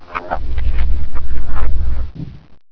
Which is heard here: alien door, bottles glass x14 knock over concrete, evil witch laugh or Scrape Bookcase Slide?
alien door